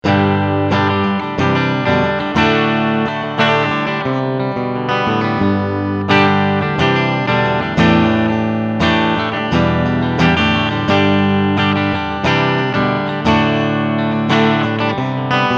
Clean Strumming Steg Pickup

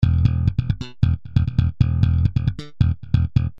Angepasster Slap Bass

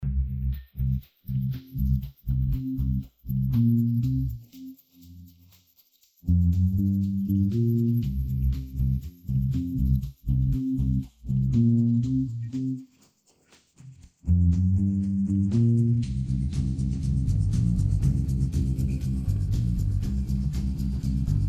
iZotope rx8 song nur bass und percussion

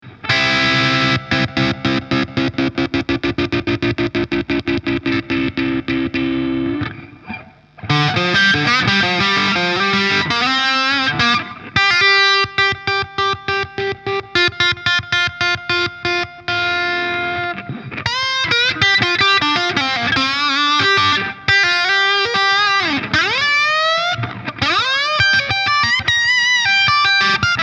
Framus Idolmaker Steg verzerrt Killswitch

